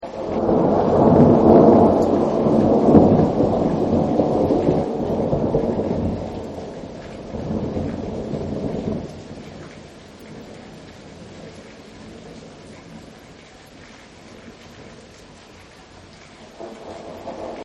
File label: Thunder Loop; Thunder Lighting; Thunder Sound Effects; Weather